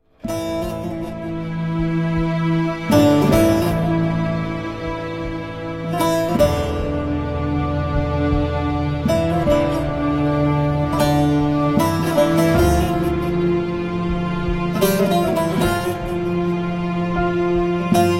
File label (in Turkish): Enstrümantal fon müzik Acı; Çukur